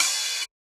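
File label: open hi-hat; eddyflux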